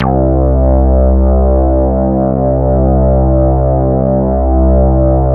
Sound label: Mini bass C2, Mini Bassics Vintage Keyboards